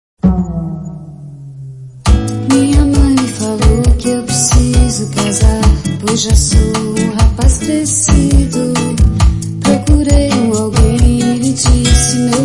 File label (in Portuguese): Beat Acelerado